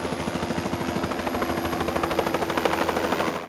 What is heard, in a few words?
Helicopter